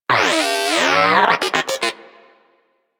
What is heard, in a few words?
Moa Inquisitive Vocal Greeting; Warframe